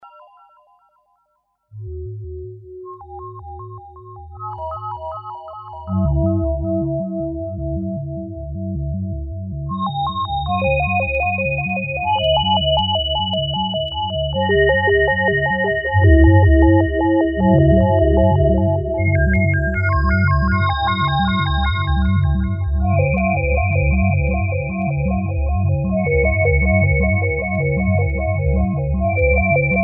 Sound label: pad ambience, emu carnaval; Pad ambient